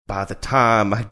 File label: starting to sing